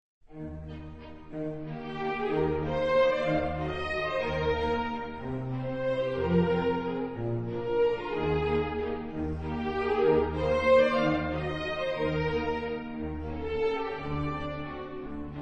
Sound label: Waltz